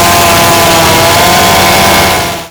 chainsaw attack; trespasser v2

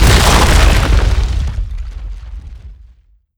barricade smash

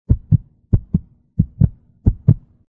heavy heartbeat

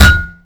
bombball hit flesh; sentry

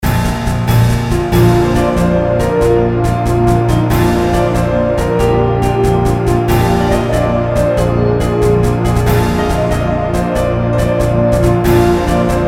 jingle bells scottish

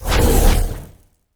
dash; demon

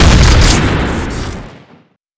scatter gun shoot crit

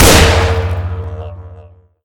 didgeridoo

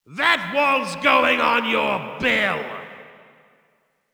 gray mann wallbreak

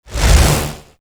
small attack; demons; marauder

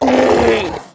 brute dead; trespasser

